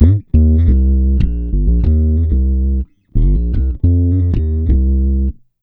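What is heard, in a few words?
C minor, Bass, 90 BPM; Sampleheads; Ultra Freakin' Bass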